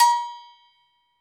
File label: Afr Agogo; Club-50 - Foundations Roland; Agogos; Fiji